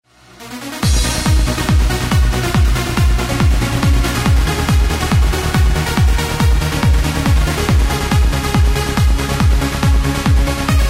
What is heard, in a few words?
Mashup